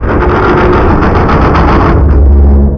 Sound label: wall move, machines; ambient; Counter Strike Model Pack; CS:GO